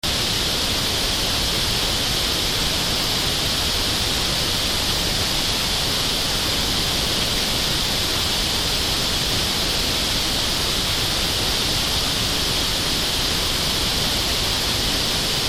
centrada en 4000

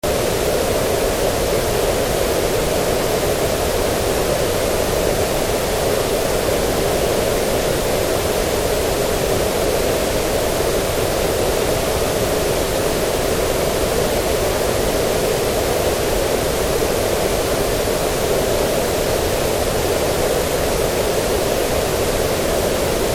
centrada en 500

